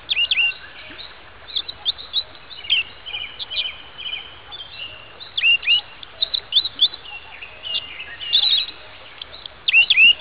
bird

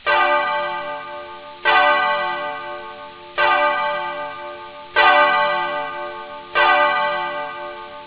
church bell

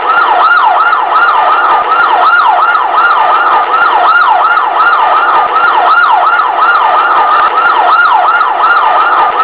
ambulance

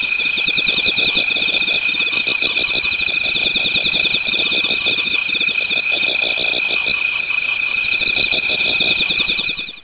insects

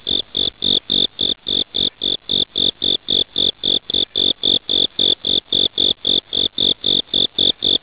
insects

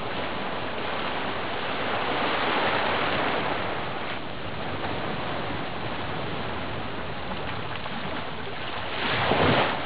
sea wave

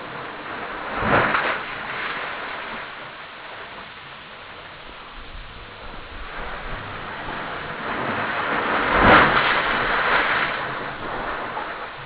sea wave

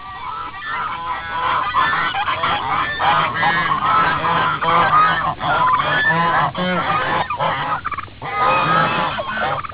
geese

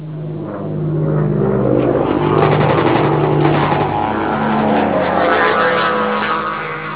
airplane

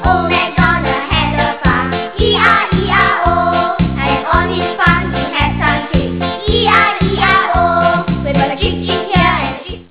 children

